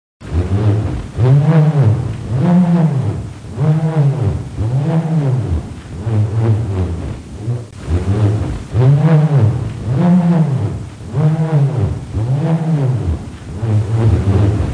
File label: bullroarer